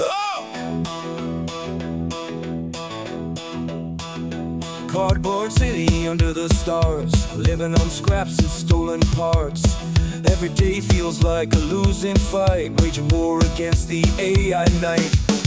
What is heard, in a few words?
Punk Rock